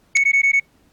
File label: Five Beeps